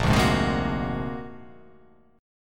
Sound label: Bb Augmented 9th Bb; Bb+9 strummed; JGuitar